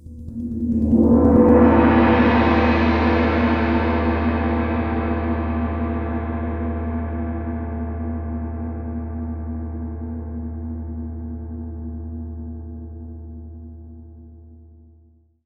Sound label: prc wuhan, MIXED GONGS; WORLD INSTR 2; WORLD PERCUSSION